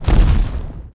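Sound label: balrog footsteps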